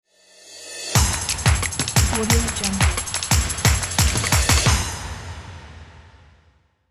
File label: drums ident